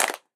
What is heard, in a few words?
Clap People, Drums; One Shots; Ableton Live 12 Lite; Core Library